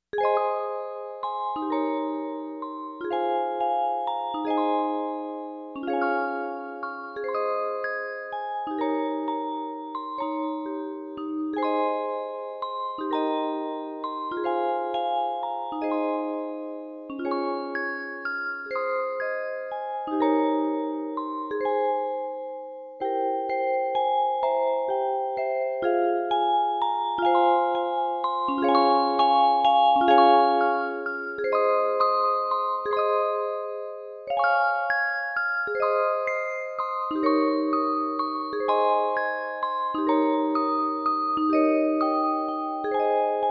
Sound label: music box